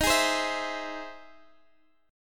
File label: E Augmented Major 7th